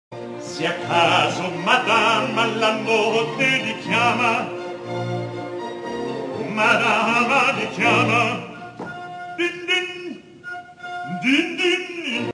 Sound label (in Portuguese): baritono; musica classica